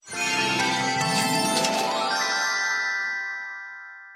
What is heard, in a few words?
coins Fly; Helloween; plinko